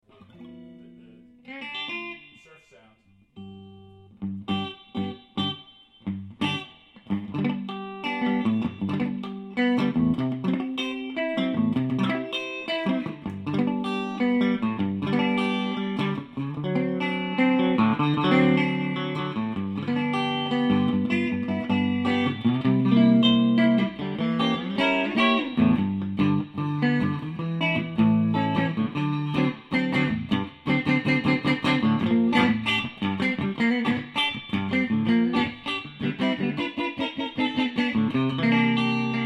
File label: scooped then clean boost